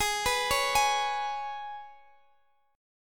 Ab Minor Double Flat 5th Ab, Guitar Open D; x,x,18,17,16,18; down

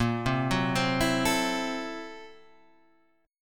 Fsharp 7th Sharp 9th Flat 5th, guitar chord

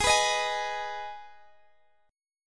G sharp Minor G sharp down Guitar Open D, chord

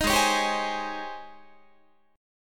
G sharp Major 11th G sharp, Guitar; G#M11 Chord

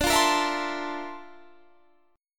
A sharp Major Sharp 11th, D

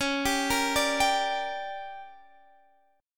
G Minor Db, chord; Gm/Db; arpeggiated; JGuitar

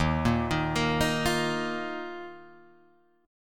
Eb Suspended 2nd, Guitar, Drop D

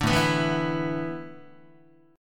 A Suspended 2nd Suspended 4th, Guitar; Asus2sus4 strummed

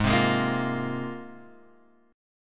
C 7th Suspended 4th Sharp 5th, G sharp

